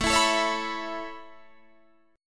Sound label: A Major Add 9th A Guitar Open D, chord